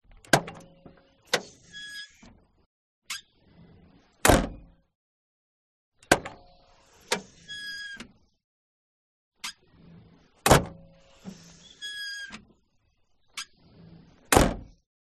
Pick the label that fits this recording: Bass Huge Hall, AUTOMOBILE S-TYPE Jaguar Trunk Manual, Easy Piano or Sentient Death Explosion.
AUTOMOBILE S-TYPE Jaguar Trunk Manual